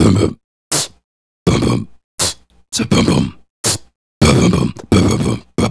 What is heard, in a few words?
Beat Box, Hip-Hop and RnB Vocals